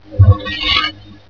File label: energy ambience